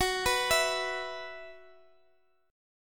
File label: F sharp 7th Suspended 4th, Guitar, DADGAD; x,x,x,11,14,14